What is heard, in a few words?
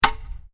METAL STRIKE; Materials